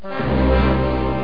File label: Horror exclamation